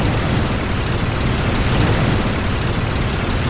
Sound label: lander engine; overlord